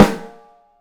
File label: OPEN BRASS, 3D Drums; 3D Sound